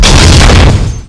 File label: heavy crash, vehicles